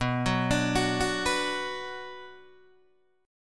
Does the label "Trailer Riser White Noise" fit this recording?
No